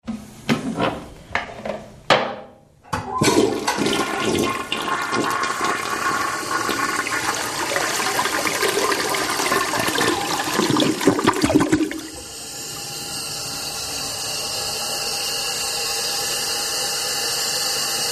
Old Toilet Seat Drop

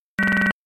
Beep Fast Drone Beep

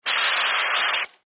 Close Steam Blast, steam

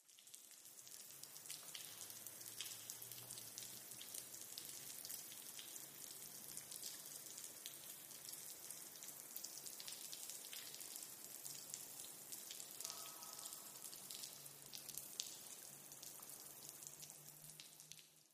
Water Spray Drops, sprays & hoses

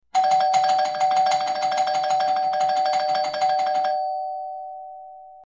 Quick Repeated Ding, doorbell

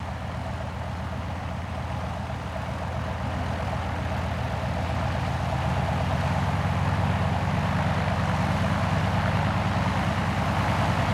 An2 Biplane Take Off; shutters & movement

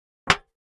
Aluminum Pan Single; barrels buckets & cans

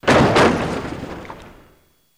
Jeep Chassis Hit, hits & rattles

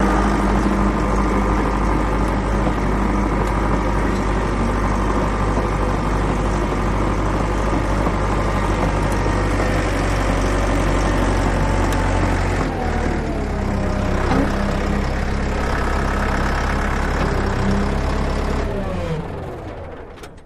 Bulldozer Run Steady Slow And Off

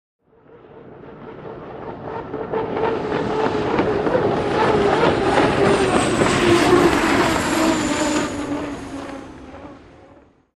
Hydroplane By Fast

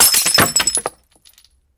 Glass Break On Wood; bottles

